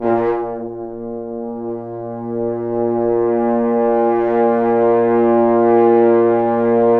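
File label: BRS French Horns sfz; Roland L-CDX-03 Disk 2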